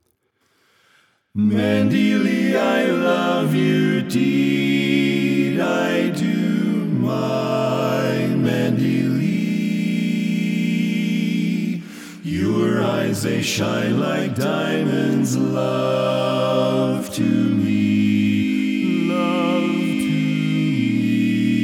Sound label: Tenor Left